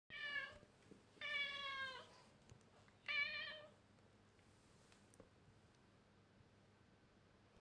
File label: meow